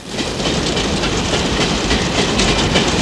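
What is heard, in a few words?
train; My Science Fair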